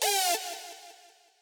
stab

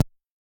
Dr Kick

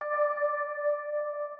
organ

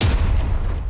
breakbeat

